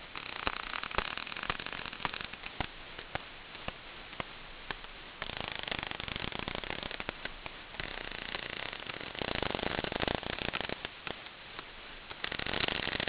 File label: rapid clicks, whale songs